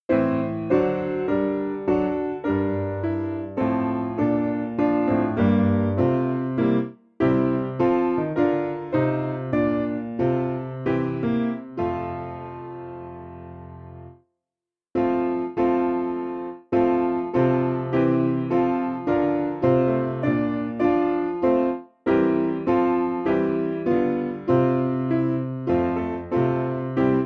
Lord Christ True Peace Westminster New Piano

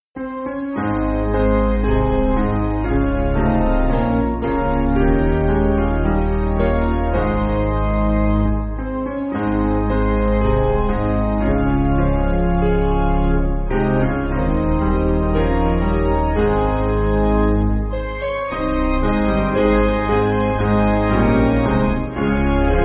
Hymnary Piano

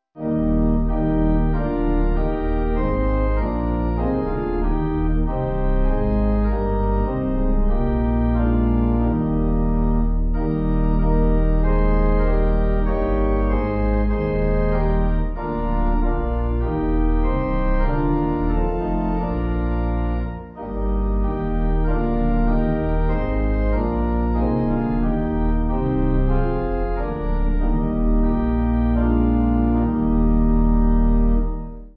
Pipe; Lead Us Heavenly Father

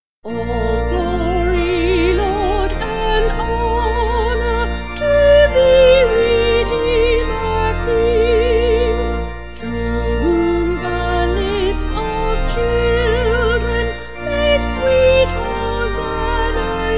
Vocal Organ; All Glory Laud; St Theodulph

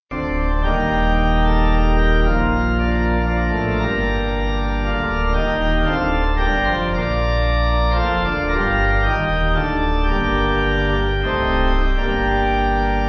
Pipe

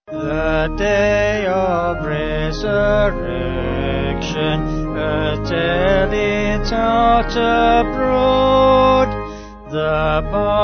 The Day Of Resurrection Vocal Organ

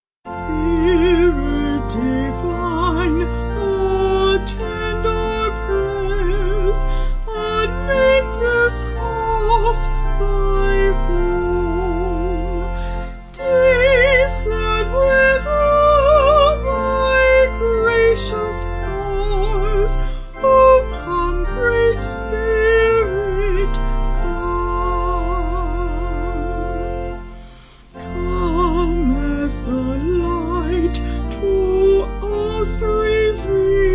Vocals Organ

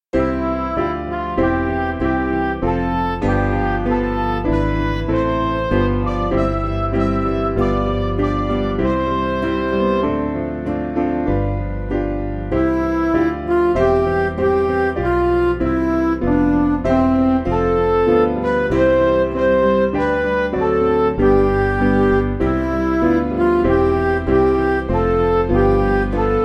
Ask In Faith, English Trad, Midi

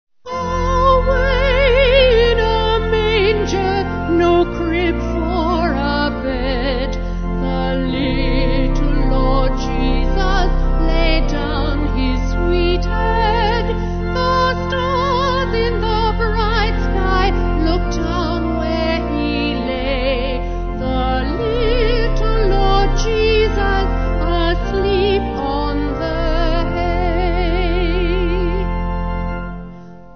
Vocal Organ

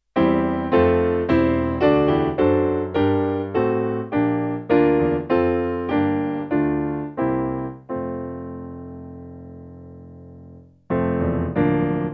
Piano; O Who Shall Roll; New England

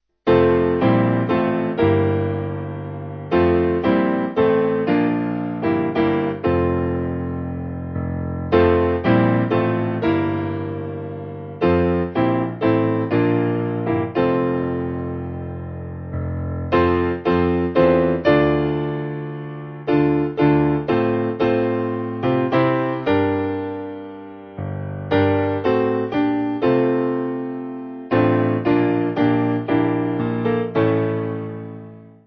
Piano

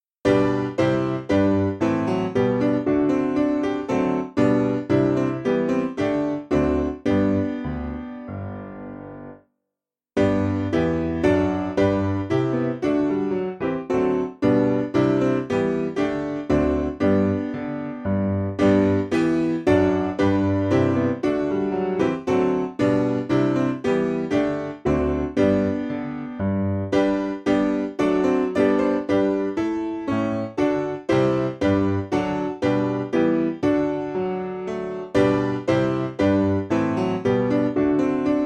O Day Of Rest Woodbird Piano

